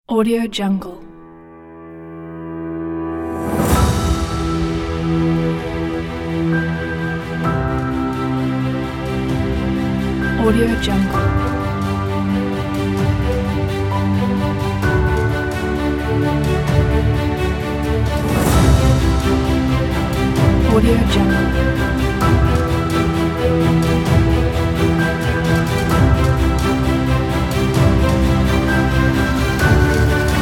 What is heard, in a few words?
cinematic epic